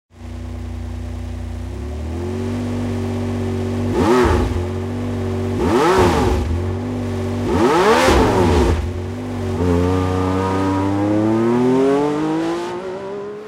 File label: mercedes amg one gas